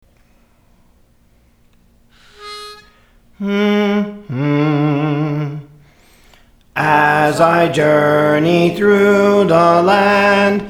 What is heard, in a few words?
Soprano, Songs; Praise For The Lord Training